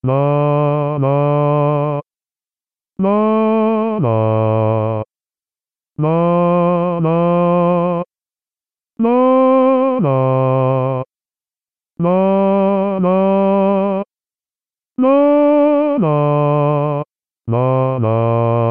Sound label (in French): sarabande basses; mesures de 1 à 8